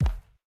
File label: Step Wood; Game rip; MiSide